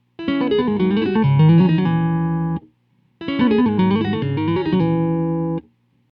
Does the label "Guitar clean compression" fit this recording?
Yes